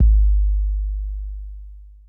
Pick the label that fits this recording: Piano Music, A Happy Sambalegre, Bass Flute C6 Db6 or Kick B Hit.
Kick B Hit